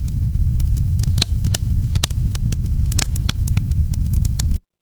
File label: fire electric crackle